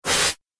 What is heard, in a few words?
Laser Energy Charge; Laser Gun Sound Effects